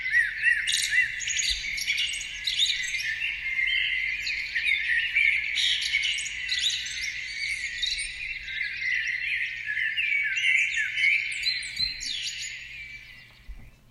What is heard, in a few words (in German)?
Hörprobe ZwitscherBox; birdybox; relaxound birdybox weiss